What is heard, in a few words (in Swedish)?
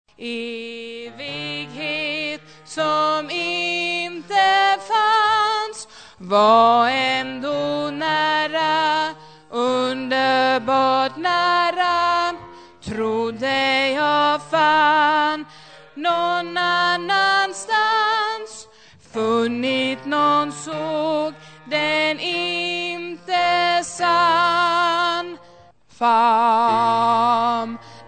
bas